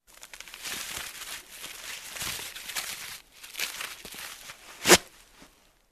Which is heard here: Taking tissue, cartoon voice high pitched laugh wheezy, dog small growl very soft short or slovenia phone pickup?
Taking tissue